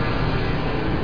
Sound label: Smelter; PC Gamer IT CD 41; machines demo